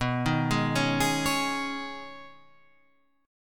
B 6th Add 9th B Guitar Standard, chord; arpeggiated

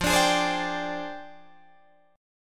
F Minor 6th Add 9th Guitar DADGAD

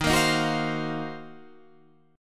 D sharp Minor 6th Add 9th D sharp down

